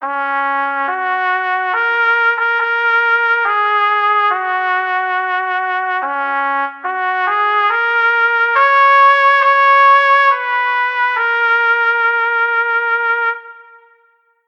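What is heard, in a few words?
Lose, game music; Project Reality BF2 1.6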